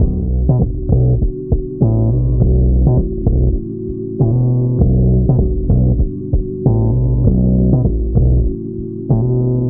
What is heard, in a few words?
bass, Loops Phonky